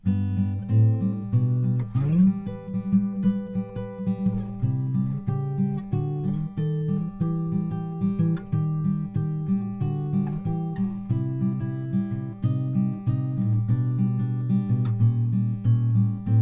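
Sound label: gtr ac front, acoustic guitar; Crossroad Studio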